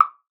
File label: WOOD B, PERCUSSION; Best Service ProSamples vol.42